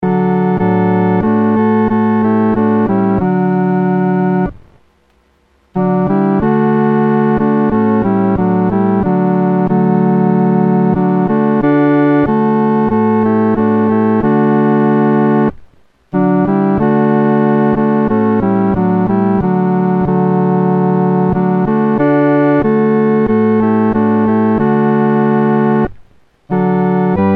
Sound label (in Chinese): 独奏 第三声; O Day of Rest and Gladness